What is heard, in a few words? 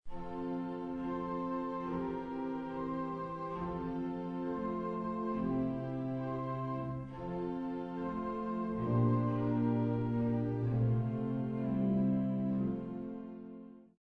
Vesper Hymn; Ryder